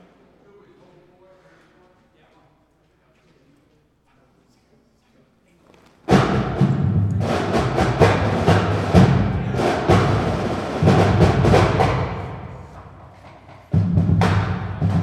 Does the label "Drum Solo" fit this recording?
Yes